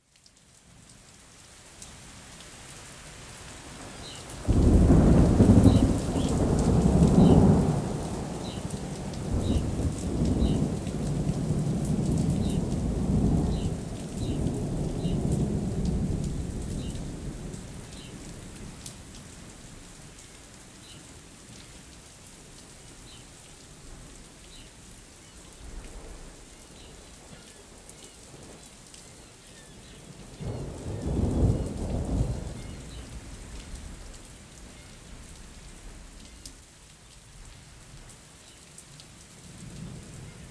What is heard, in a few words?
distant thunder